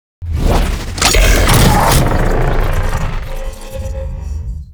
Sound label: Monster Door Take Key Action